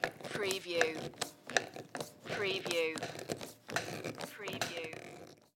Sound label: Screwdriver Screw In; sound effects bible